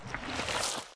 wave slide; smite; cthulhu

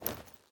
samurai armor; smite; god odin

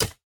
dagger catch; smite; pele

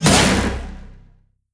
Land; smite; raijin

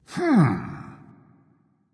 thinking, voice; Smite; ymir skin6

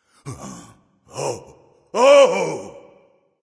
fear; smite; ahpuch

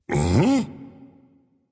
surprise; smite; voicepack; xingtian

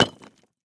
Rock Hit; smite; izanami

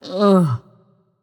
nv sigh; smite; voicepack; morgan le fay

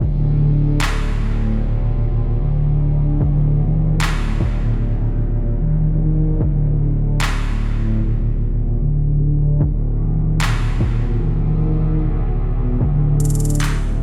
mus background lp; Smite; hells syndicate

